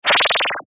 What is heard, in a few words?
Beep Tone; Multimedia Web Interactive; Technology; Beeps; Blastwave